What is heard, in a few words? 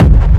Techno Kick; 30 Techno Kicks 2025; Ghosthack